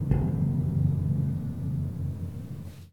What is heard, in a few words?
suspense mute; Cinematic Metal Impacts